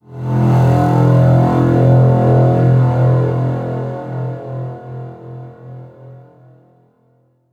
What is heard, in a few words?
VFX Atmos